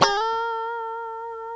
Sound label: FUNK SLD, STRAT; Spectrasonics; Hans Zimmer Guitars VOL-1